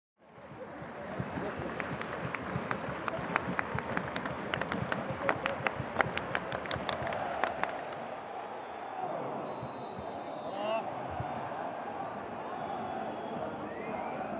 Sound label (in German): Stadion Fan und klatschen